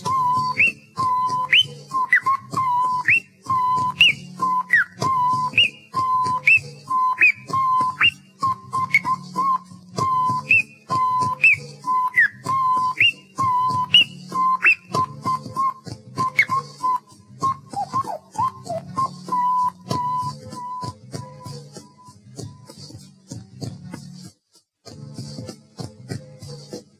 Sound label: scratches